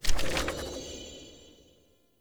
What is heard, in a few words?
UI Note Close